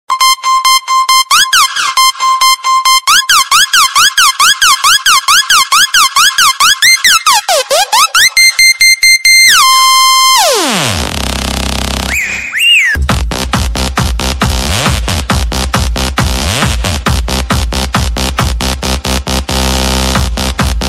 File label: Cool Techno 3D